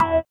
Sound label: SITAR; Spectrasonics; Metamorphosis; INDIATEK